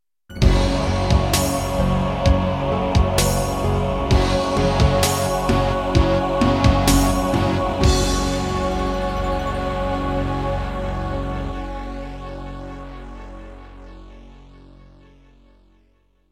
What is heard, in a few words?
Roland SD-90 GM2 MODE; Doom (Sigil); Introduction